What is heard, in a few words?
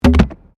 PLASTIC RUBBER PIPE; Material components for constructing more complex sounds; Plastic (Sound Effects:Materials)